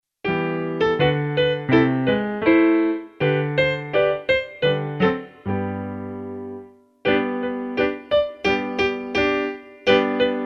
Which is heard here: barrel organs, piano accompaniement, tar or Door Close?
piano accompaniement